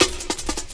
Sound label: Old School Break, Drums